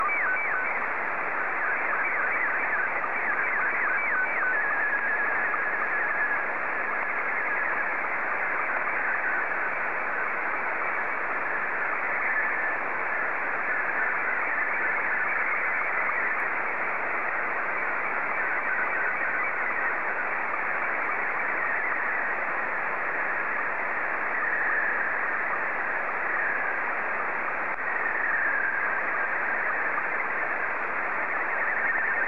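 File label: slow-scan television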